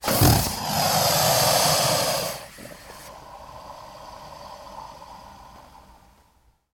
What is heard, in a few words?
Alligator Snort Brea